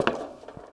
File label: bleacher step; Golden Crayon Sounds